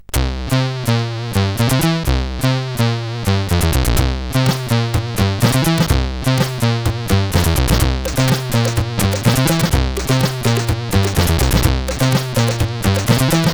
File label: Intro Jam, CSG8580R5